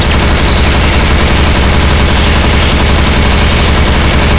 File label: sentry heavy fire crit; tf2classic